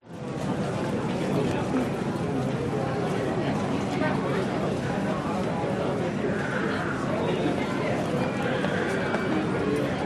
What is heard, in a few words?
airport ambience jfk walla busy crowd